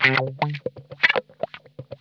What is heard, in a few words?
COARSE RIFF, Funk Guitar; Zero G - Funk Guitar